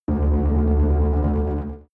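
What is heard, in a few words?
extrinsic buzz short, energy; energie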